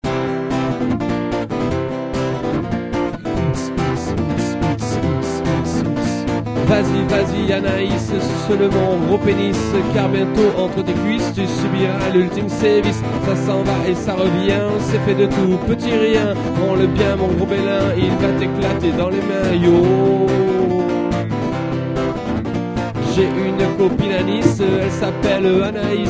acoustic

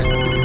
alert

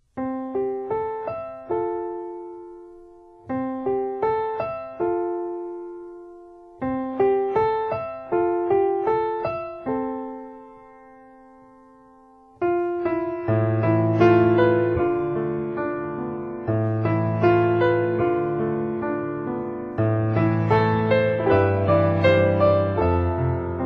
piano windstruck

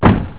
click

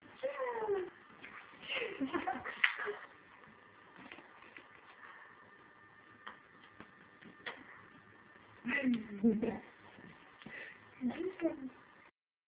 Clapping hands

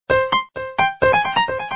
piano

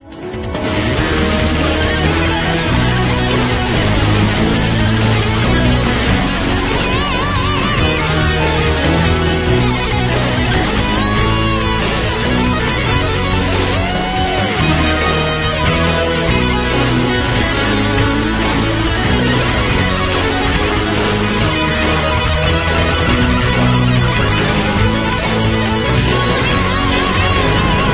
piccolo solo

